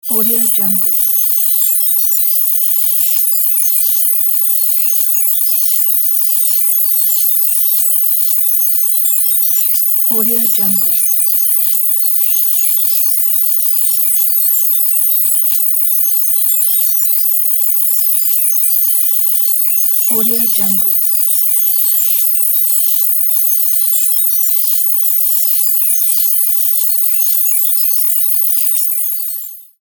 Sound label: high energy digital data transmission; sound ideas